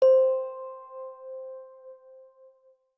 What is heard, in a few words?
homepod setup